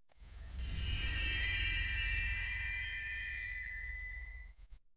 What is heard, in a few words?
intimate contact sound, intimate